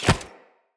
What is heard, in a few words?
Chest Thump; Characters; Enemy; Foley; Sinecure; Melee Grunt